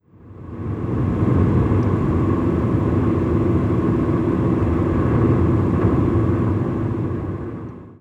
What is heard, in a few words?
freeway